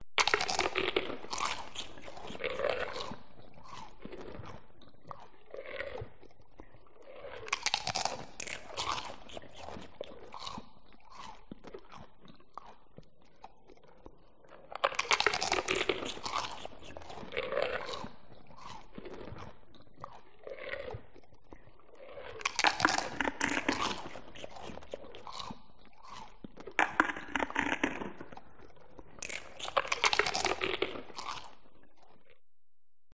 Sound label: Monster Eat; audio hero